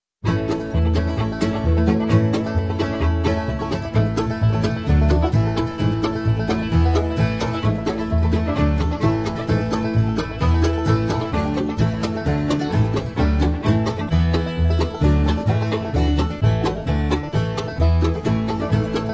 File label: Banjo In The Hollow